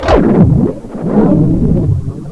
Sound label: pit drone melee attack; svencoop; cryocell